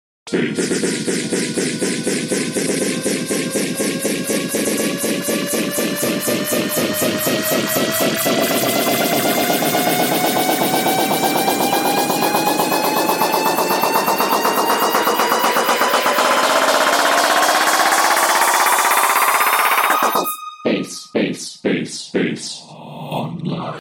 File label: Bass Explode